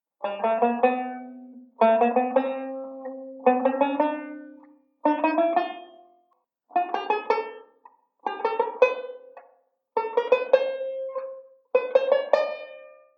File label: ii V 3rd String Notes, banjo; Beyond Chord Melody Volume II